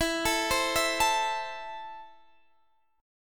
E Suspended 4th Sharp 5th E, down, Guitar, Half Step Down